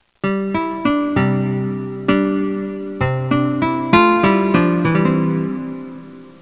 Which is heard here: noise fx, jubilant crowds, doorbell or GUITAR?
GUITAR